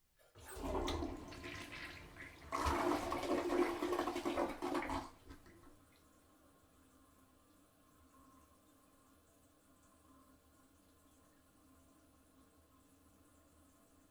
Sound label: toilet flush small bathroom